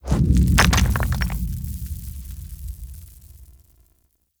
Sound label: Town Stone Debris, Ambience; Warframe; Duviri